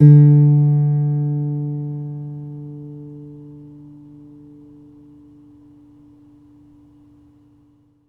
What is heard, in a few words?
Foley